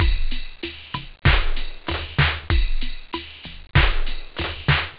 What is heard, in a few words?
house; OctaMED SoundStudio V1